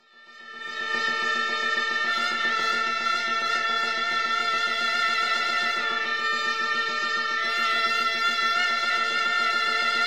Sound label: taksim mizmar